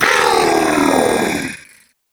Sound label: scream